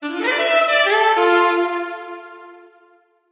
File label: Achievement